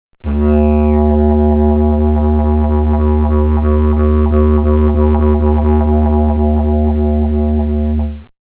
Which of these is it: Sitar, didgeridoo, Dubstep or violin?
didgeridoo